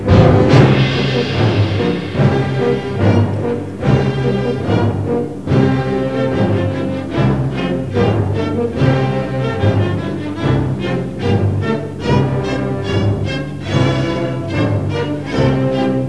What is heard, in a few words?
procession